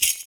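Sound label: PERC, percussions; Univers Sons - Raricussions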